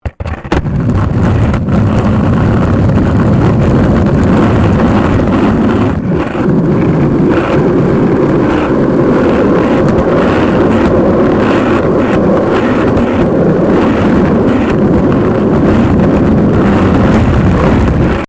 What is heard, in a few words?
SKATEBOARD UNDERSIDE ASPHALT ROUGH; Skateboard sounds; Skateboarding (Sound Effects:Sports)